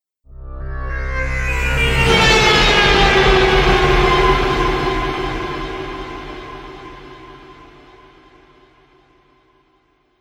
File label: Trailer Hit Haunted Metallic Impact